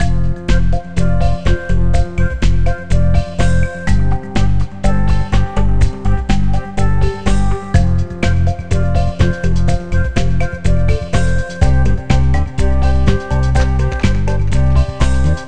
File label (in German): MUSIK; MOBICLIC 89